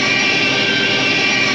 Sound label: Metallic Energy Scrape Loop; SFX Kit; Sci-Fi Loops